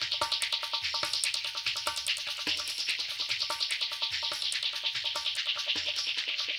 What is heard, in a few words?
BOLERINO; Spectrasonics; Liquid Grooves 1 & 2